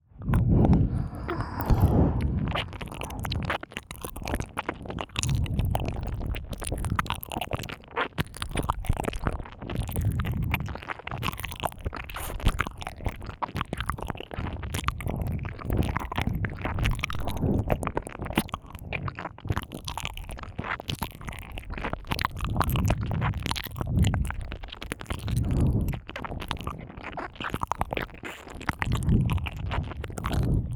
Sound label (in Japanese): お知らせ右耳舐め; 耳舐めタイマー編